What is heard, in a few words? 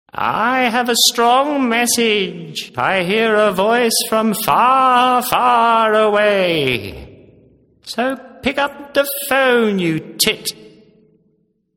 strong message cool ringtone